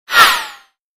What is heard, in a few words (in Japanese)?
ヒュン音